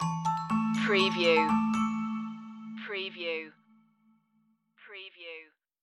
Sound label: bell shoppe stinger